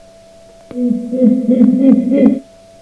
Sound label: gorilla hoots; Gorilla hoots generally indicate a slight annoyance and can sometimes be territorial in nature